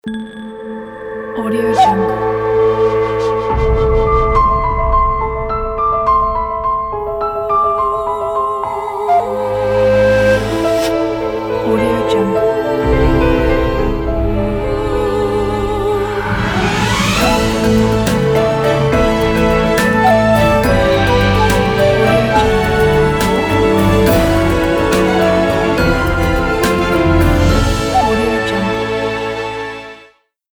save nature ident; flaviocortizo

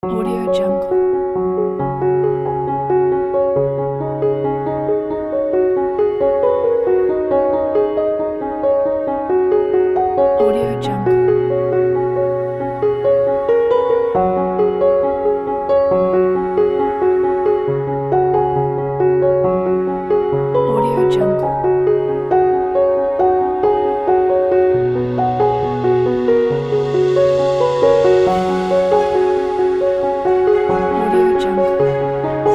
wedding background piano

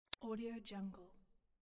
button click

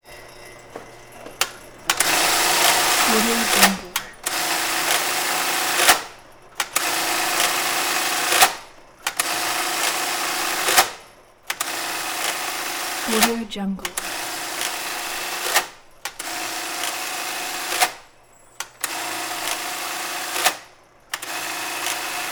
button hole machine huge buzzy rattling bursts

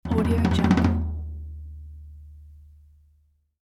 low tom roll; sound ideas

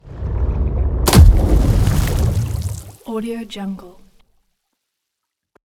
water explosive water hit; magelem; soundmorph

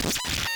noise glass distorted various; soundmorph

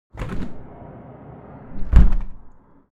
object window open flight flyby close

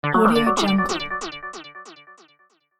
laser synth radio station ident

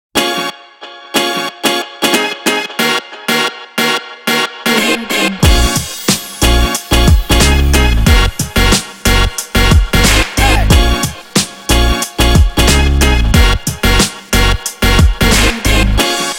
hip hop background

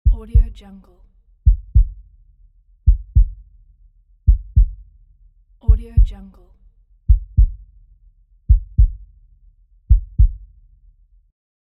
heartbeat

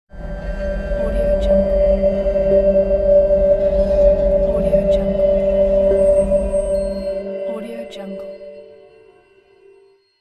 shining ambient

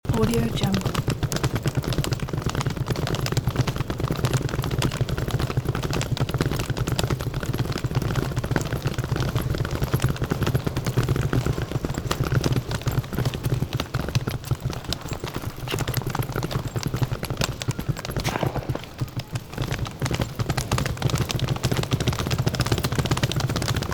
trolley bag rolling loop; fxprosound

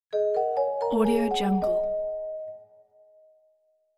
clavichord chinese accent

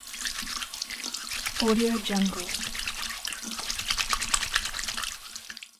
hand washing

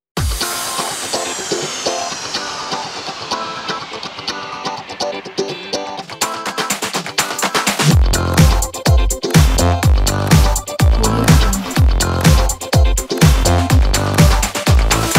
sport ident; postsoundmt

